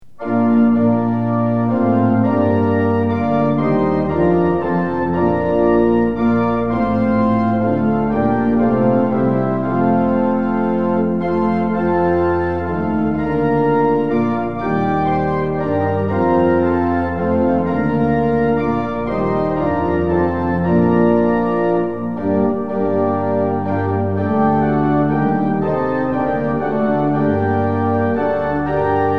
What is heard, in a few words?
tune; hymns; lillenas